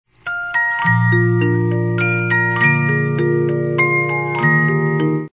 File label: Amazing Grace, 50 Note music box